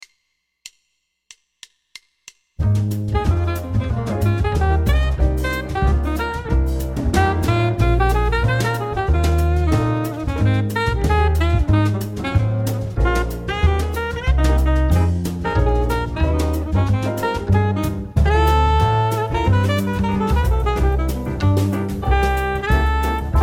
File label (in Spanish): Musica Jazz Instrumental